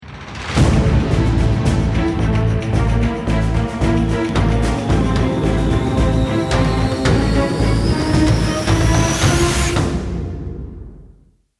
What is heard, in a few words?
bomb ten sec count